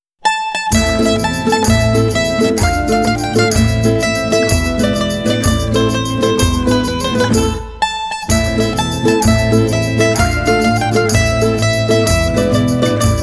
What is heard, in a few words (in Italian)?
Tarantella Napoletana Mandolinista